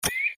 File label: reelspin click; Formosan Birds